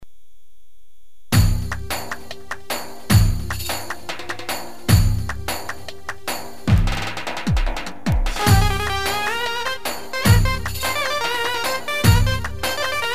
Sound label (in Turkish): roman lead ses; gw-8 ritimleri